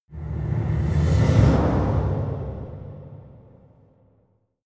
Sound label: metallic whoosh